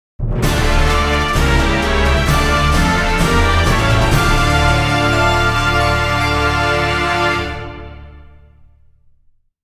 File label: Level Clear, STAGE CLEAR